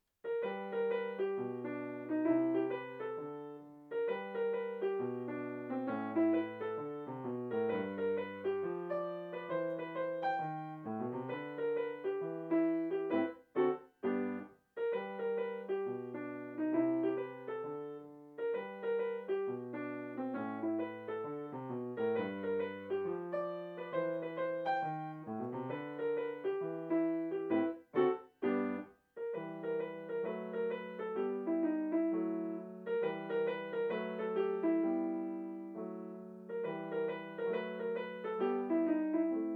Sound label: jazz; compositions for piano